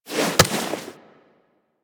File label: Item Pickup Foley; Warframe; Tenno